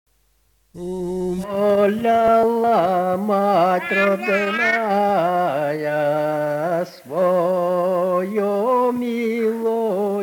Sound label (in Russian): Умоляла мать родная; Верхокамье